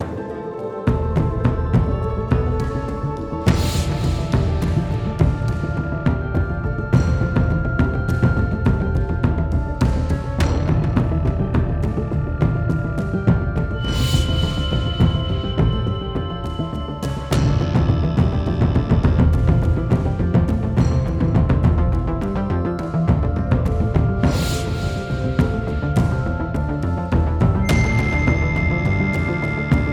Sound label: Loop Action; the walking dead michonne